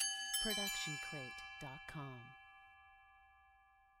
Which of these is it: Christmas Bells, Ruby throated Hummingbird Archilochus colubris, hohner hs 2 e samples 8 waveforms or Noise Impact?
Christmas Bells